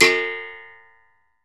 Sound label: STRG; Berimbau Pallet